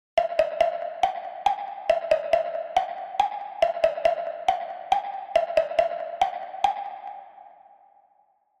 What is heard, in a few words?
Bubble Perc